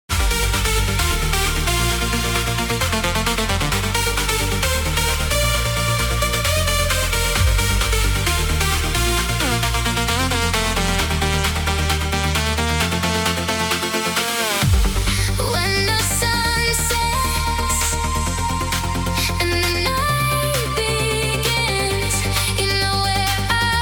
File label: Electronic Forest; Live On Air Performance